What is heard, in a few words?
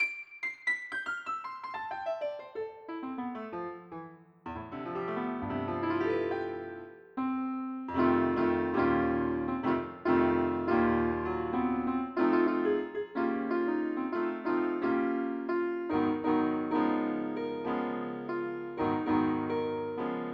piano